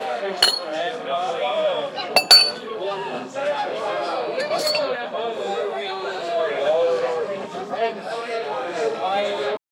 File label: wild west bar ambiance